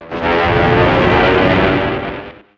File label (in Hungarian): zuhanas; Pure Pinball